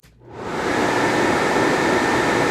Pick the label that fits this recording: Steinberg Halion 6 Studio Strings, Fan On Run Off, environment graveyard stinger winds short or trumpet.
Fan On Run Off